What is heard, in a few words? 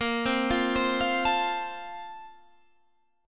A sharp Major 7th Suspended 2nd